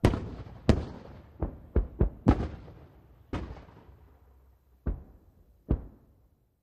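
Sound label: Mortars 42 Mortar Fire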